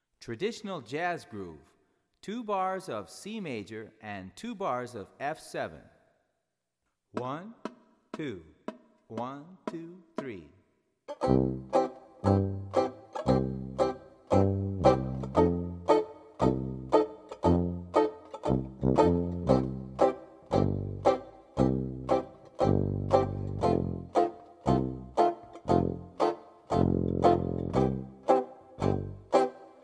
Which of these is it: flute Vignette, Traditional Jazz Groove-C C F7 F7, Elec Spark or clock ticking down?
Traditional Jazz Groove-C C F7 F7